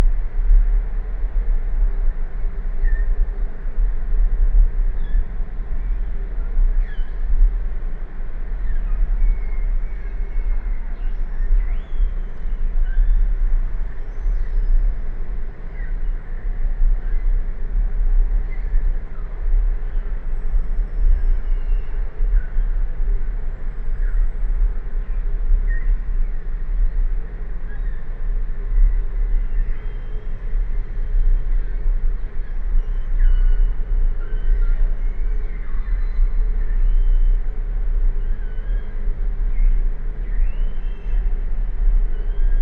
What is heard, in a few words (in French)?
Bélugas et moteurs; entre deux tours de moteur